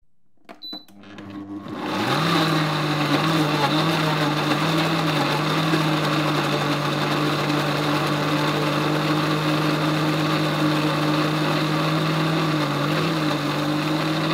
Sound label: Blender Sound Effect